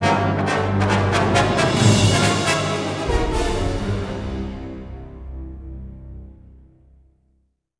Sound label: battle, music flourish